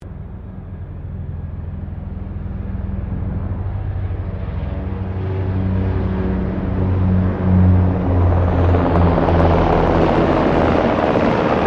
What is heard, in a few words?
HELICOPTER 1990 SIKORSKY UH60 BLACKHAWK PASS; Helicopters; Vehicles